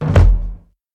long tail reverb steel kick drum sample F key

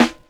warm sounding acoustic snare sound a sharp key; Mono; snare drum samples

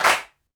clap single hit f key; Duration 0:01; Samplerate 44100 Hz; percussion

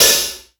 wet open hi hat sample F key

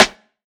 loud snare drum a sharp key

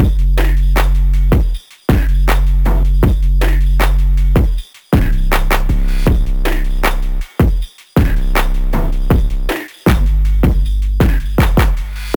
Dirty Phonk Saturated Drum Loop Gm 158 bpm

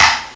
clap single hit a sharp key; single hits one shots

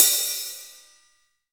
airy sizzle open hat sample b key

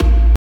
natural techno bongo kick; single hits one shots; kick drum samples

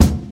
natural kickdrum sound c sharp key

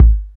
clean bass drum single hit g sharp key

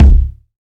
low tone kick sound c sharp key, kick drum

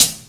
short hat B key; hat samples

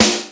high quality juicy snare drum sound g sharp key

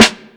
smooth snare one shot a key; Channels Mono; snare-drum-samples

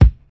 80s clear kick drum sound a key; kick drum samples

